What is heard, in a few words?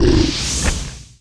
india cannon die